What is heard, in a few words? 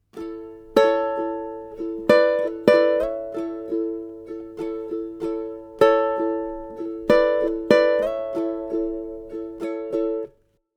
Lick, ukulele